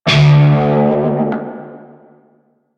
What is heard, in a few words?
Shawzin Flourish Oct One D; Music; Warframe; Narmer Shawzin